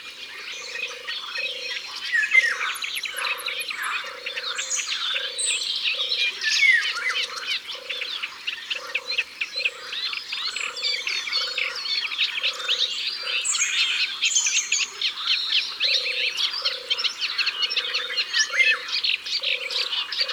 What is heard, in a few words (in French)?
Tourterelle des bois Au bord de l'eau